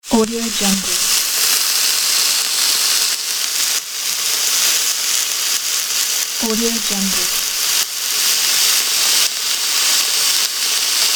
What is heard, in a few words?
sizzling meat